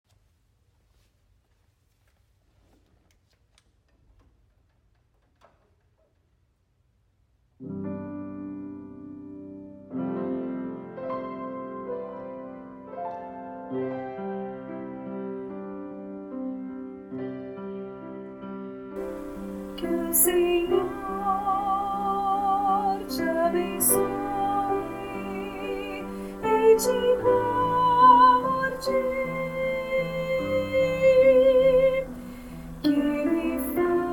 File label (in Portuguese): Bencao soprano; coros; encontro sinodal de coros do sinodo rio dos sinos